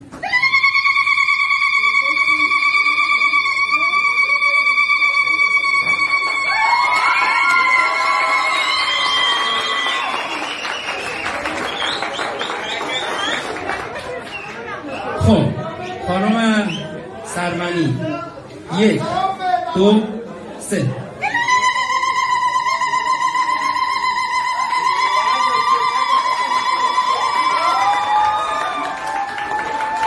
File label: lol sound